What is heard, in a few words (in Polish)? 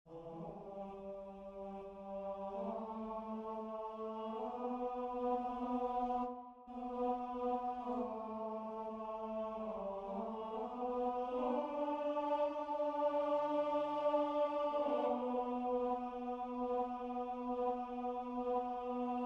Tenor, spiew; Wielki Czwartek